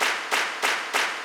LOOP

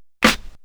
CLAP SNR

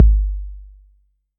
808's

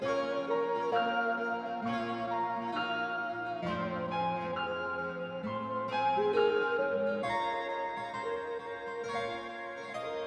Dragged sample

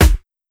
Snare

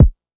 session kick

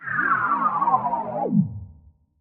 TRAP TRANSITIONZ

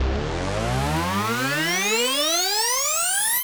Rise

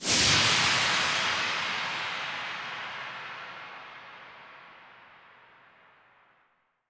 Crash, Cymbals; Crashes & Cymbals

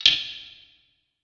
percussion verbed

